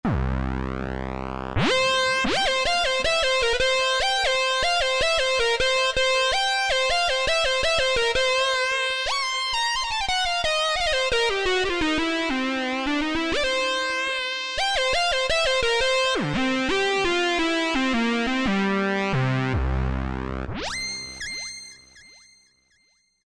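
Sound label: dyna pulse lead; Roland SRX-05 Supreme Dance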